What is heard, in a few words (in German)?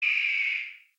adler; tiergerausche